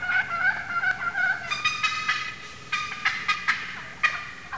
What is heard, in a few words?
flydown, turkey; hunting